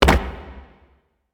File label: victory footfall; smite; chiron